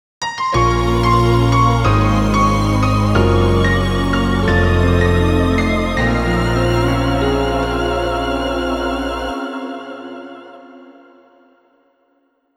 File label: Game Over BGM; Phantom Breaker